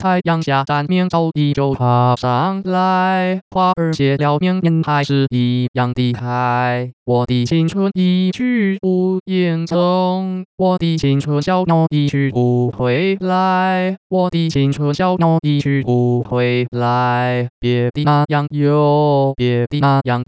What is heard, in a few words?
Synthetic singing voice (male), No coartcltn, Chinese; Mandarin Singing Voice; Pitch Coarticulation for Mandarin Singing Voice